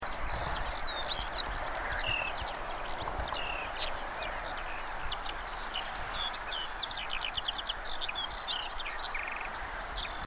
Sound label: Motfla, Bulgaria, Motacilla feldegg; Dragoman Marsh; breeding birds